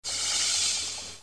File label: cymbal; zeropoints; Open Sesame